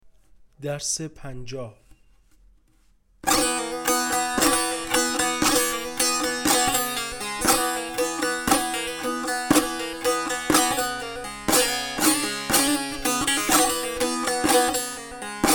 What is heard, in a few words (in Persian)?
مشق تنبور